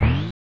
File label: Beam; Bishoujo Senshi Sailor Moon S Juugai Rantou Shuyaku Soudatsusen; snes